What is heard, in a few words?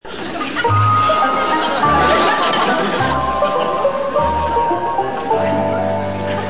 music